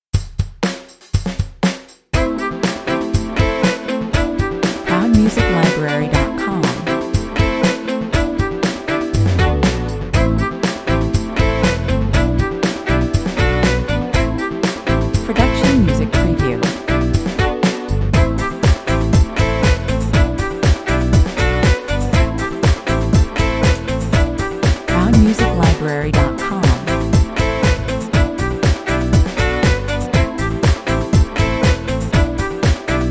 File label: dancing strings 120 bpm